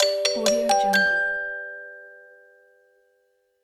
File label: the music box